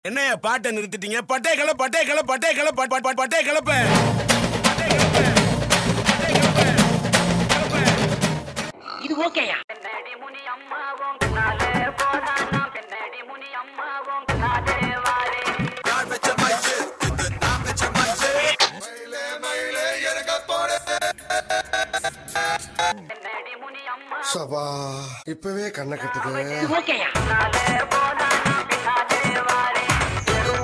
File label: Remix Bit; Tamil Remix